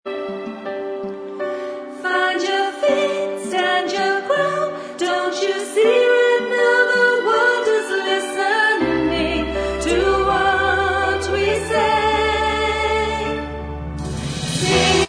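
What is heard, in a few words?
backing vocals track, backing tracks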